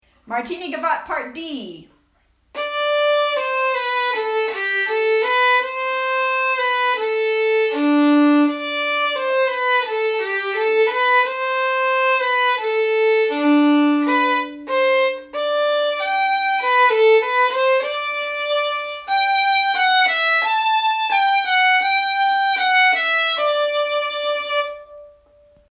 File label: Martini Gavotte